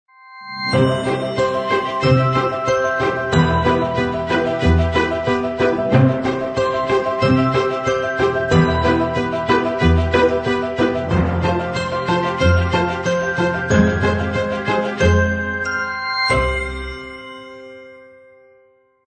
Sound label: Brilliant Ideas logo